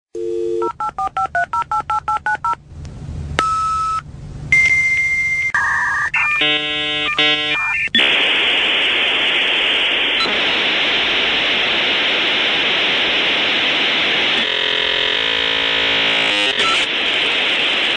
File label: dial up sound